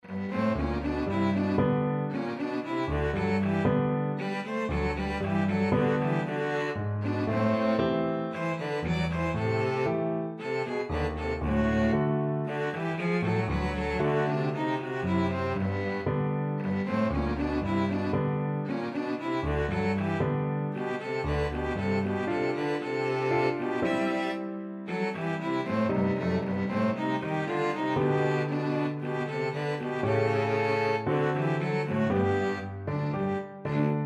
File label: violin cello duet